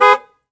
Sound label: HORN, auto; trasporti